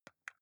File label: console controller xbox arrow key press